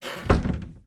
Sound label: Close Door Wooden; Lethal Company